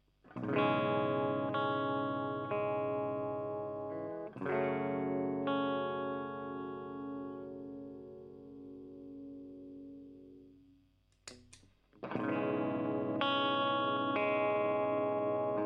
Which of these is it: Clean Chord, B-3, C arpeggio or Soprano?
Clean Chord